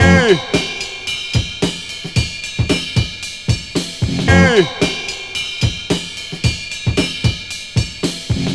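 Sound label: 112 bpm drum loop f key, kick tuned to the F note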